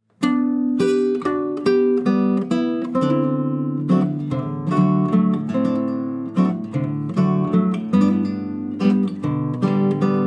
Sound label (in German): Landler Bauernmusi; zwiefach; alpen